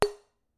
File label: bellplate 20mm; bellplates